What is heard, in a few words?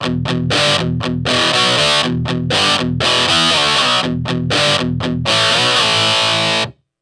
Guitar